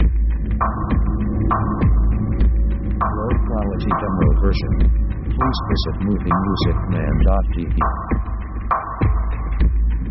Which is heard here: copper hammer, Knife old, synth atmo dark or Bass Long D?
synth atmo dark